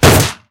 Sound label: bullet hit inside